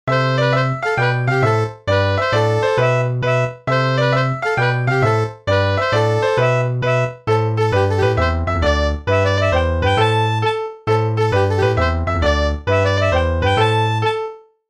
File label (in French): gavotte